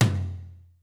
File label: TomLow, Roland JV 1080, Drums